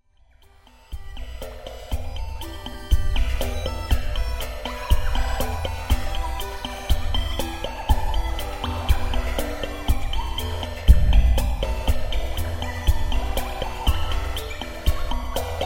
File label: synth with bird tweet phasing; PHOBoS and Blue Hell